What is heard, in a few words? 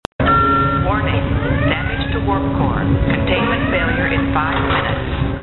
damage